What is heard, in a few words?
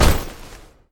step